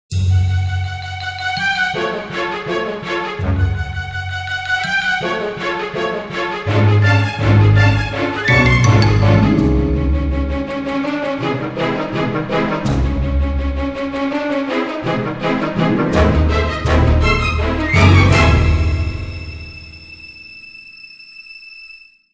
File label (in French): avec harpe